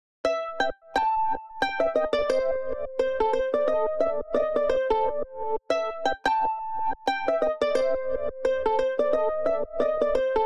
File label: Classic Melody Loop - 88 BPM A Min Pluck; Cymatics; Oracle Sample Pack